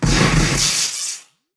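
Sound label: impact bullet energy titan shield arc; Titanfall 2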